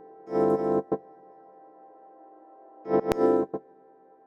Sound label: crime mood, Loops; Polyend; Loops n trouble